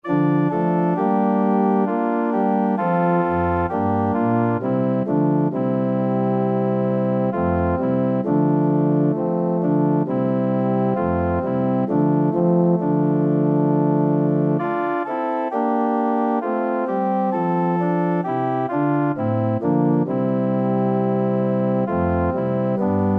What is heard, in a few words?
offertoire ORG; organ